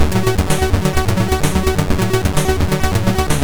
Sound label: Music, MOS6581R2